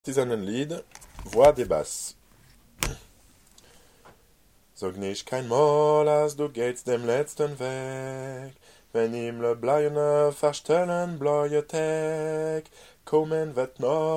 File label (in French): basses; Partizanenlied